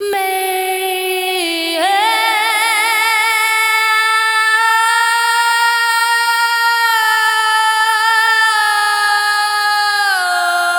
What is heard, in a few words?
FEMALE, Dance Vocals